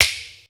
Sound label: sneppers, Clap n Snap, Snares